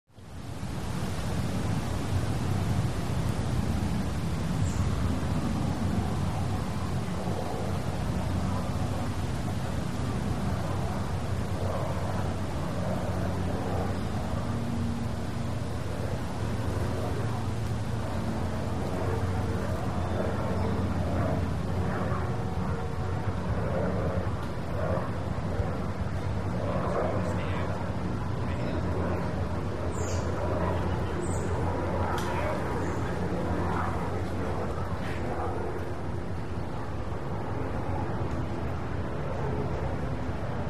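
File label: Prop Plane Medium Distance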